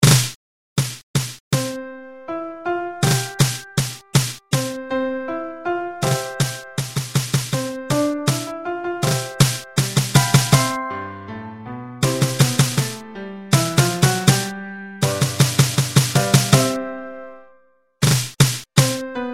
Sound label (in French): tambour et piano; olivette